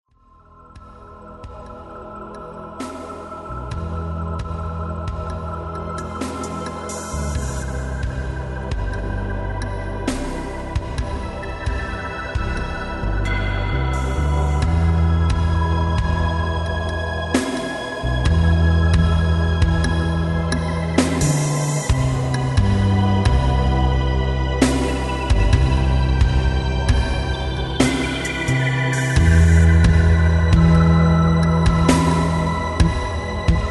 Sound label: instrumental